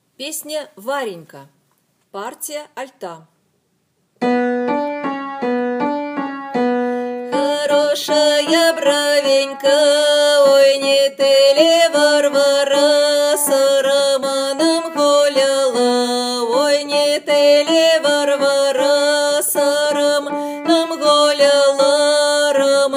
Alto; Varenka

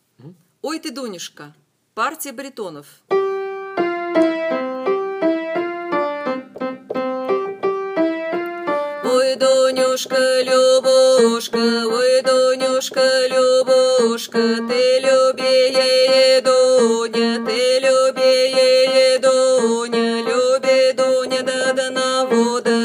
Baritone; Oy Ty Duniushka

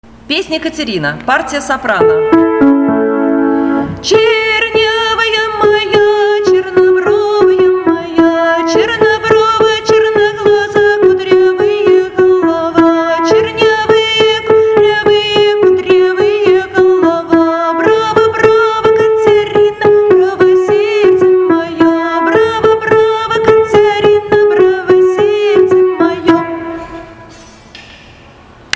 Soprano

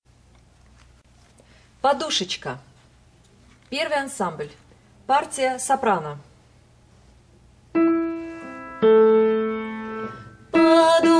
soprano; Podushechka

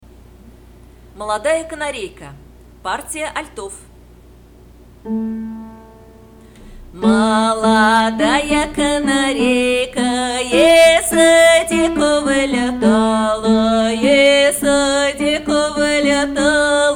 alto; molodaya kanareika

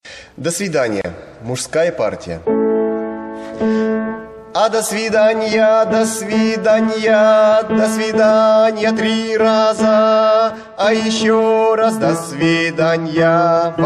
Do svidania muzhskoy

